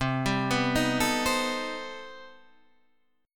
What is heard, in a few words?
C Minor Major 13th C down Guitar Standard; CmM13 arpeggiated; JGuitar